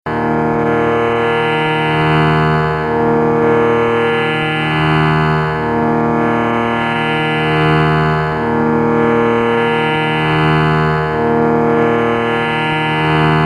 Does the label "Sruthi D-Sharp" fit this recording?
Yes